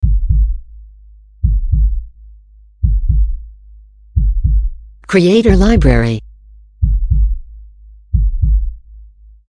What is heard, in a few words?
Heartbeat No Reverb